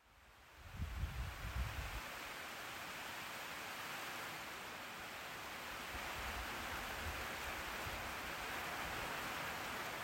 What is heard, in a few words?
Rain Ocean Birds; Kauai